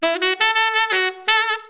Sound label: JAZZ